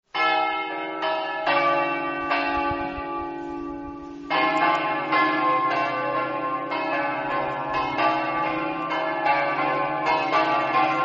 treppog, campane

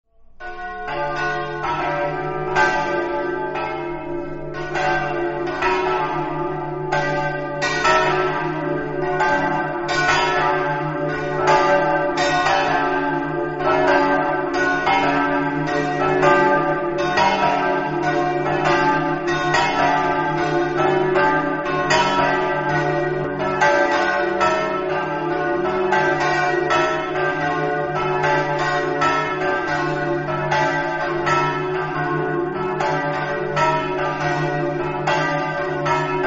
preone, campane

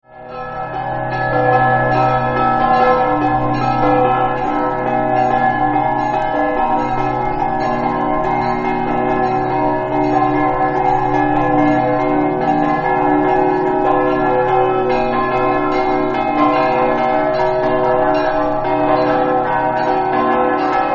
spilimbergo, campane

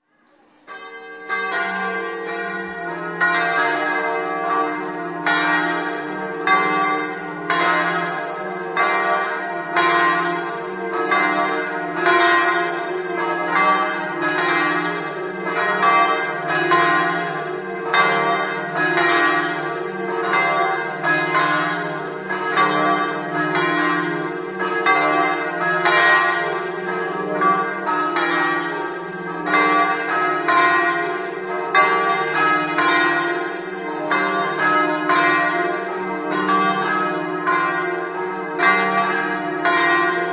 pesariis, campane

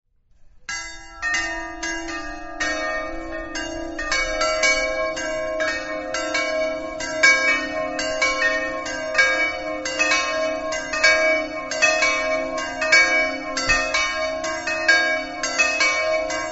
primulacco, campane

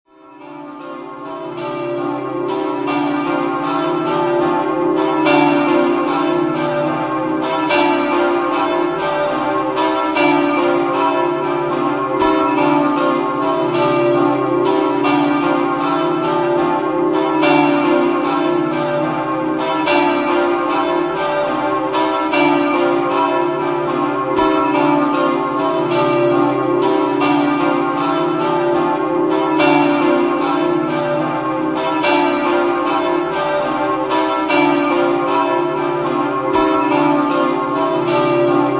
svito, campane

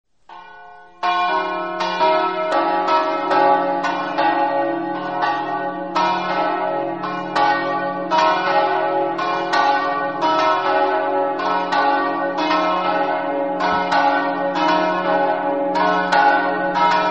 sfloriano, campane

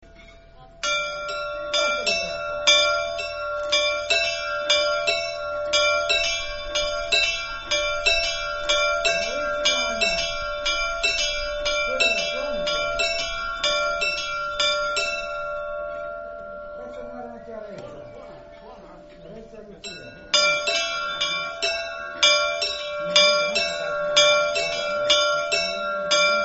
vernasso, campane

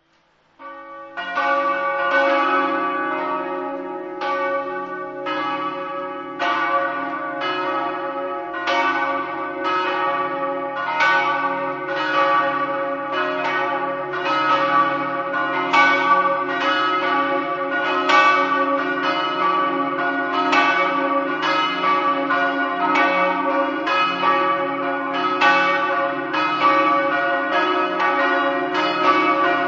sgiorgio, campane

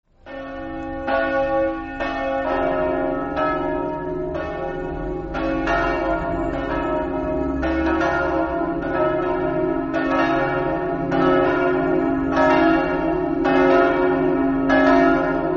plasencis, campane

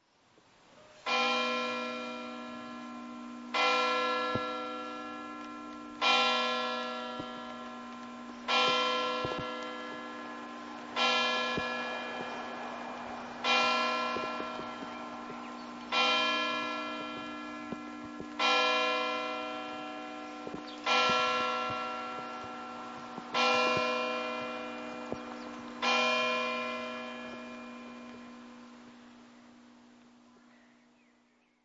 gorizia, campane

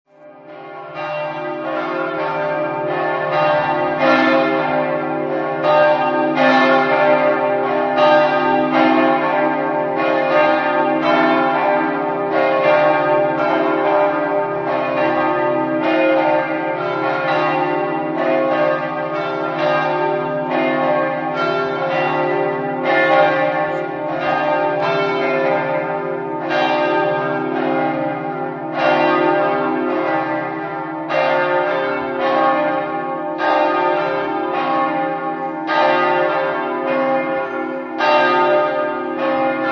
cividat, campane

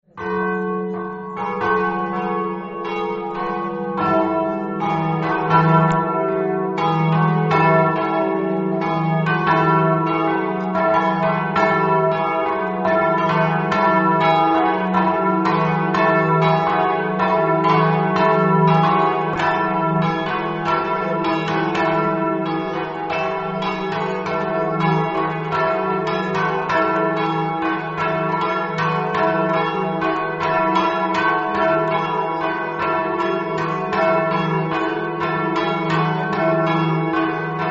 cividale, campane

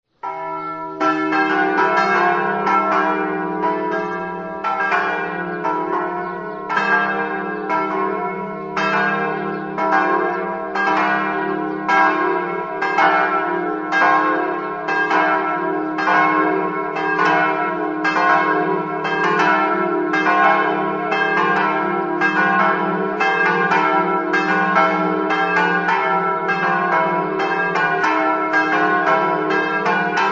bagnaria, campane

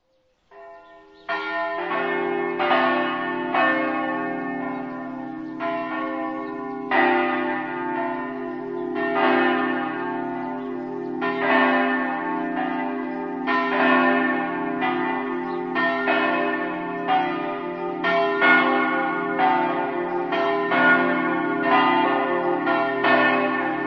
martignacco, campane

